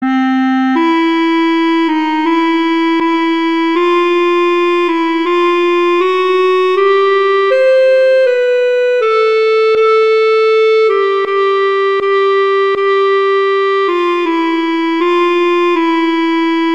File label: I Heard the Bells on Christmas Day; Christmas Beginners